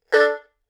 二胡 演奏技法 左手勾弦 右手拉奏 中等力度

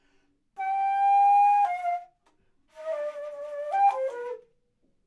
低音横笛 特有演奏技法

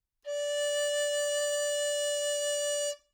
G调梆笛 筒音D 强奏力度

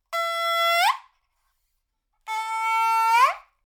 中音板胡 演奏技法 大上滑音

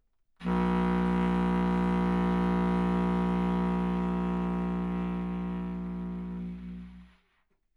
低音筚篥 筒音 自然衰减 力度强

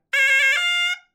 南嗳仔 演奏技法 打音 单打

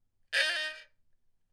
六角弦 特有演奏技法 单打

